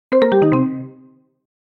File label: cat fail